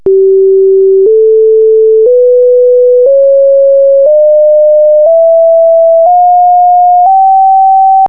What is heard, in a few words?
G4 scale